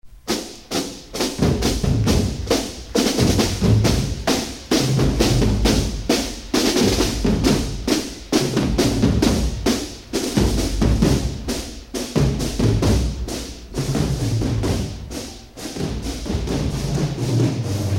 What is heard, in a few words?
Long Roll Cadence